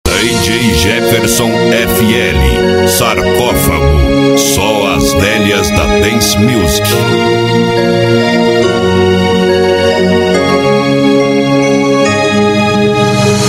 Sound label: dance musik